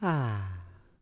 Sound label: Buddist Ahh; Civ4 warlords